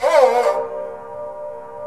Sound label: SARANGI, Indian Ensemble